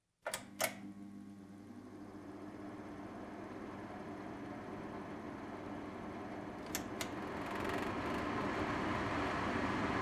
shum vytjazhki

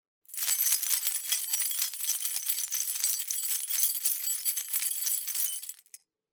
zvon kljuchej

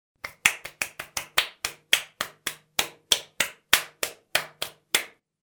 zvuk ladoshek

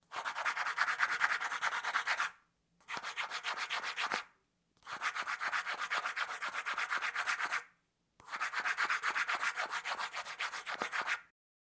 zvuk karandasha

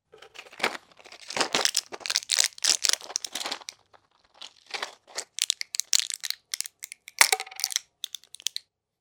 zvuk tabletok

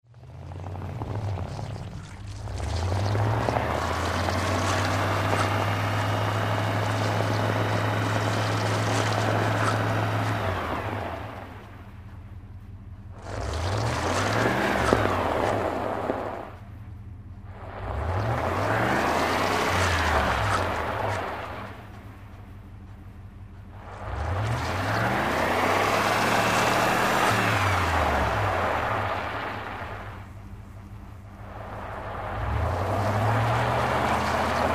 zvuki probuksovki

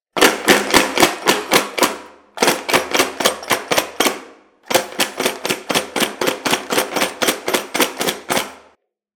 zvuk trjaski

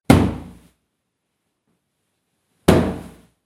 zvuk podushki

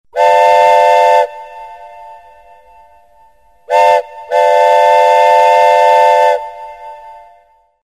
zvuk gudka poezda

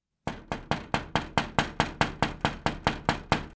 stuk v okno zvuk

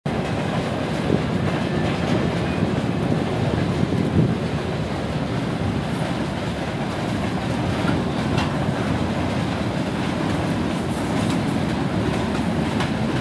zvuk poezda

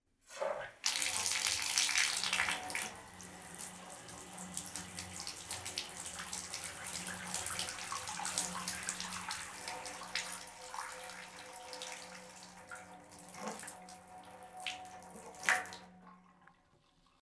zvuk krana

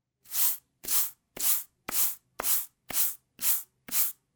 zvuk shhetki

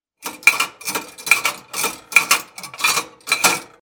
zvuk tarelok

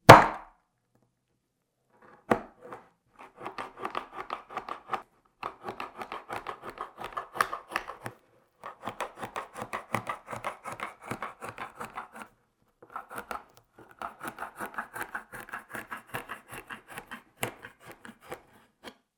zvuk konservnogo nozha

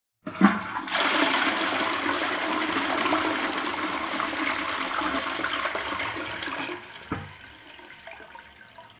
zvuk unitaza

